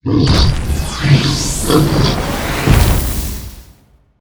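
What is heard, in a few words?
boss grogo death, monster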